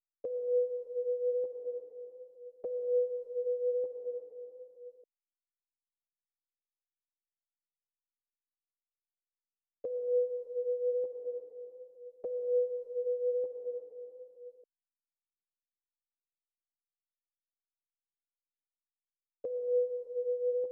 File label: 100 BPM E Min Sine Key, Afrobeats; Melodies; Loop Stems; Cymatics; GHOST (Beta Pack); Alora